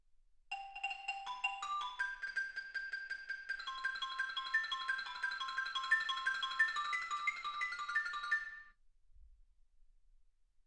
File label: xylophone